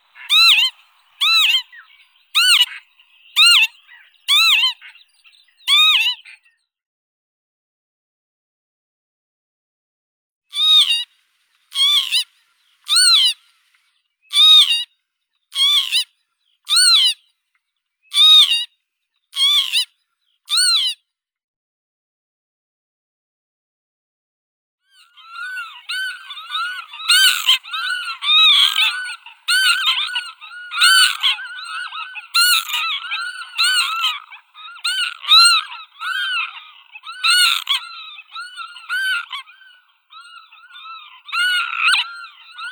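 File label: northern lapwing, vanellus vanellus; chibis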